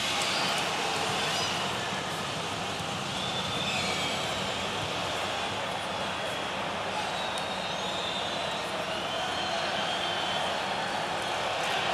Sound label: Crowd always loop; soccer slammers switch gamerip 2018